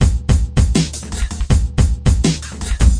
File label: 160 break dubstep, DnB Breaks; 141 to 185 bpm; Ethex